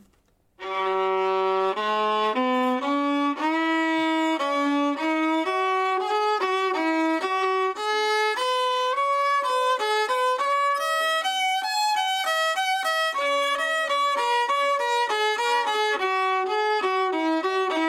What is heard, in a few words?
arioso reglued cheap strings